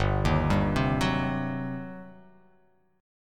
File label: G Major 7th Suspended 4th Sharp 5th, G, down